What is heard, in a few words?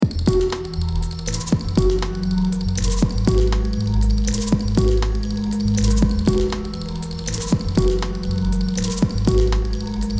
kinetic metal